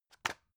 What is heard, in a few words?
drug package drop deep soft; berufe; arzt